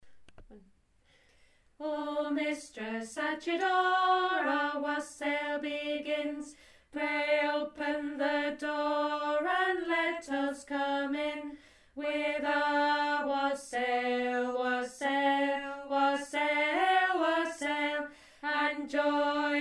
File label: Wassail alto